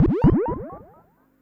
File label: Perc Synth Moog Delay